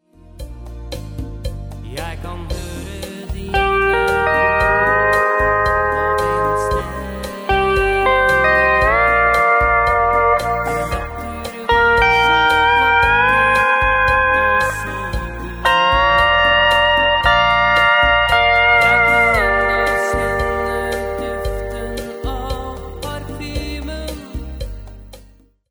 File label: steel